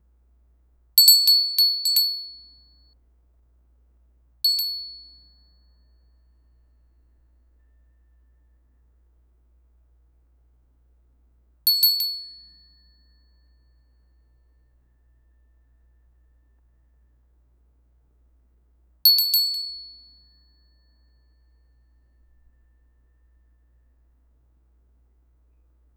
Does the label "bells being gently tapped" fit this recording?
Yes